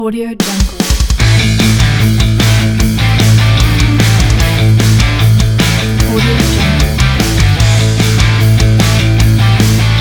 hard rock riff ident

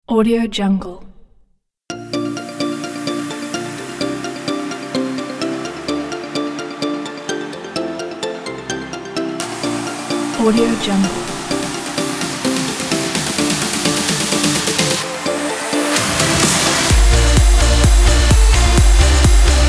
edm

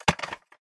put back wood utensil plastic counter organizer

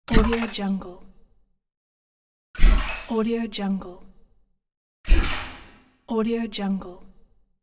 booster game hit

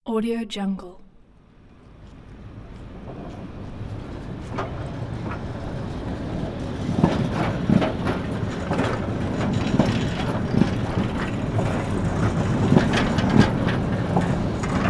tram passby through crossing

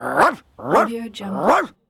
a cartoon dog bark

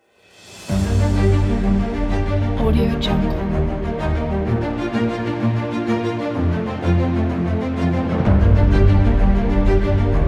inspiring cinematic trailer

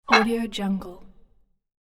squeak tiny screw; hollywoodedge

